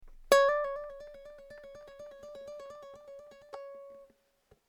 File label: trill, ukulele